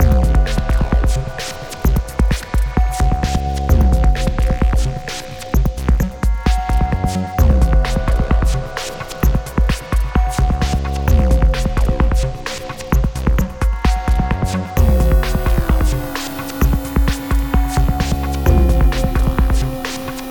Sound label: loop